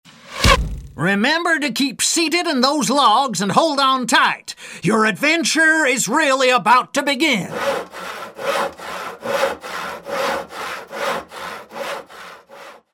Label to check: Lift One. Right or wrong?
Right